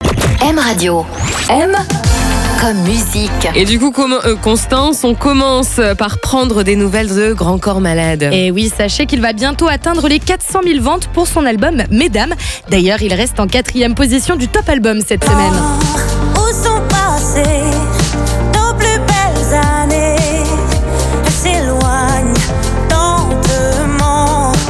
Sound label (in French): soprano; m comme musique